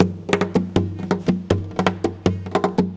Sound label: GUIT M KNOCK; Best Service; World Colours